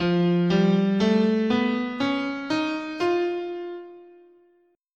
F Whole Tone, scale

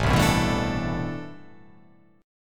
A Minor 11th A, Chord

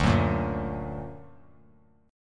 D Augmented D down; D+ strummed; JGuitar

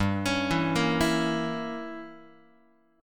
G Diminished 7th Guitar, Gdim7 chord; arpeggiated; JGuitar

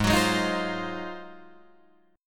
Ab-13th-Ab Guitar Drop D, Ab13 chord; strummed; JGuitar

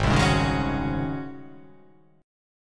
G sharp 11th G sharp, down; strummed; JGuitar

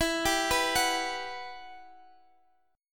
G Major 7th, E; Gmaj7/E; arpeggiated

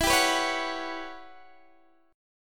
E Minor 6th Add 9th, Guitar, DADGAD

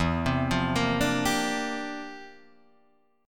C 11th E

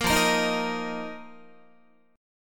F 7th Suspended 4th F down Guitar; strummed

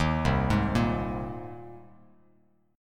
C Minor 7th Sharp 5th C Bass Standard; Cm7#5 arpeggiated; JGuitar

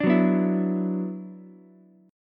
Gb 7th Suspended 4th Sharp 5th Gb down Ukulele; strummed; JGuitar

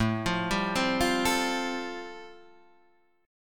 G 7th Suspended 2nd Suspended 4th A

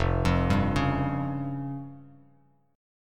F Suspended 2nd Sharp 5th, Bass Standard; Fsus2#5 arpeggiated; JGuitar

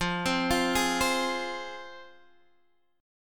F Minor, Guitar, DADGAD

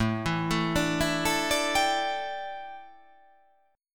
A 7th Suspended 4th, A, down

